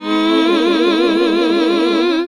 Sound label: HAL GOSP., GOSP. MMMhh